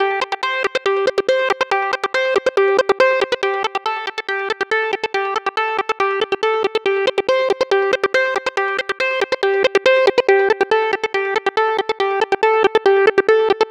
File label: NRG Lead, trance; Leads; Sonic Foundry (Sony Creative Software); Trance NRG VOL-1